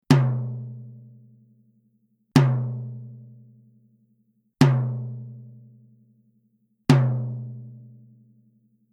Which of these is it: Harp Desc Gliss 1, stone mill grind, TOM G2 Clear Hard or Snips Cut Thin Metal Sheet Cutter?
TOM G2 Clear Hard